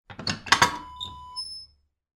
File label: wood burning kitchen stove door open